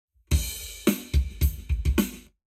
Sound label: Drums, 90deg; eBreve; Lab Wet